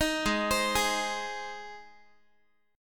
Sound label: G sharp Major G sharp Guitar, chord; arpeggiated; 18,16,15,18,x,x